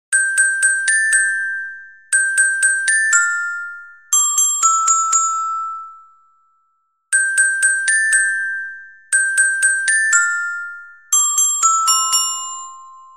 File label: Carillon Soprano